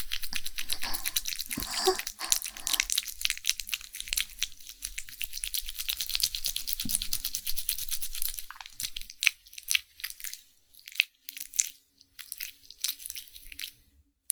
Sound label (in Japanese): 寝くちゅ イキ我慢